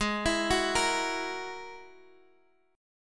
G sharp 7th Suspended 2nd Sharp 5th, Guitar, Half Step Down; G#7sus2#5